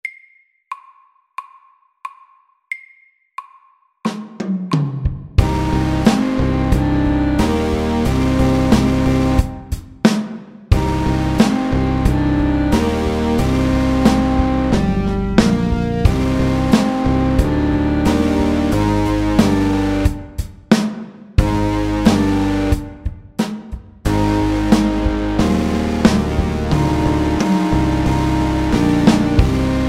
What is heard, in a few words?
Metal rock SLOW 90